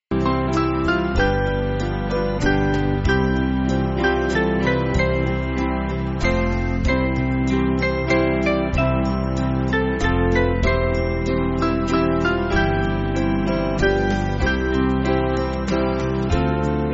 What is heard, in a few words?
Band